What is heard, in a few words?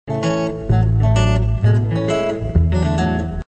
jaunty guitar pitch shift; ElectronicMusic